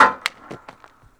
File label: Hockey Puck Goal Post Hit; Sony Pictures Sound Effects Series